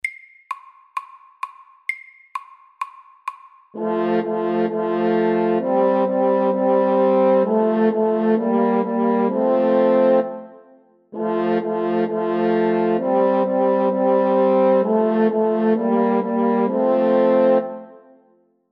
fanfare FORT; First fanfare

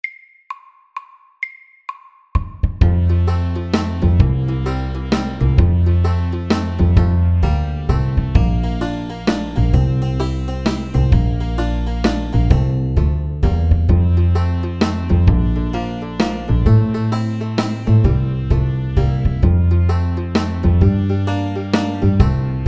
Country shuffle FORT

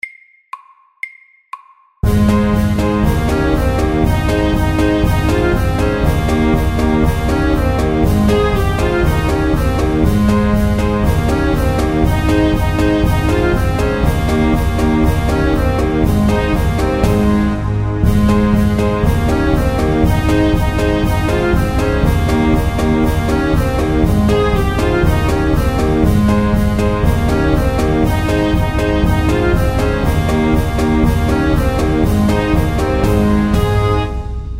Cancan MEDIUM 120

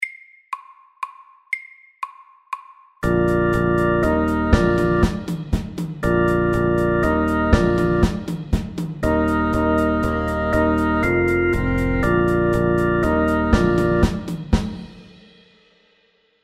Fusion FAST 120

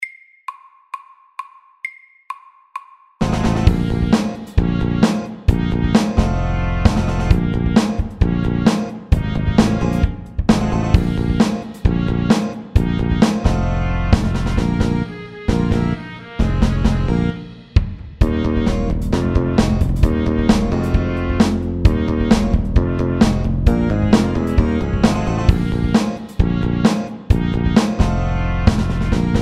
Funky FAST 132; Funky monkey

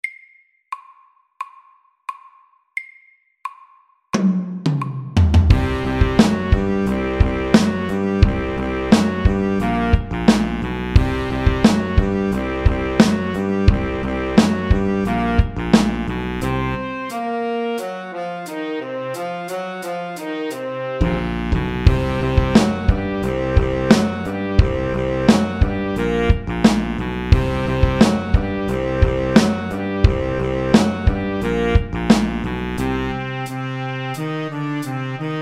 Have some funk MEDIUM 88

